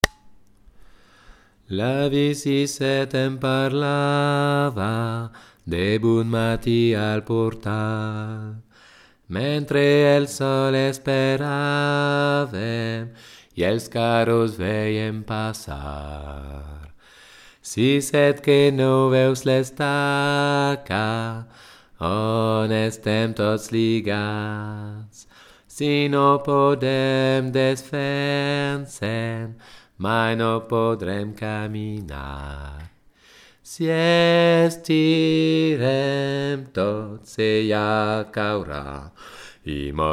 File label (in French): Basse